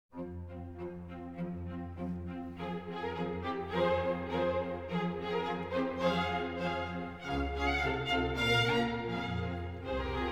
Moments musicaux for piano, Moment Musical No 03 in F minor; Schubert